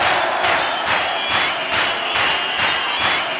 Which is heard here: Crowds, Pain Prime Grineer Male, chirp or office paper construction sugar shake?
Crowds